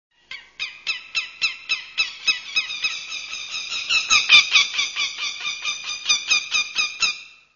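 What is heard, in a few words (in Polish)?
jastrzębie, głosy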